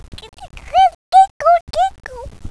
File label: tickle